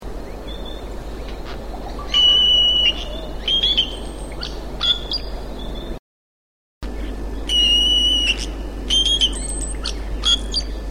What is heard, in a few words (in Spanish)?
tordo gigante, cantos, aves